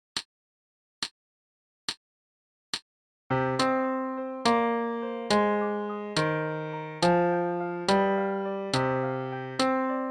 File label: jig, medium tempo with solo